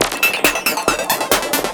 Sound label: percussion loops, Techno; PS-45